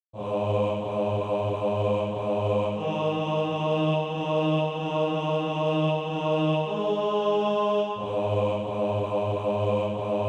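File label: bass, SATB Practise Music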